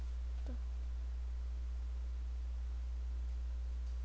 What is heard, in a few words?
door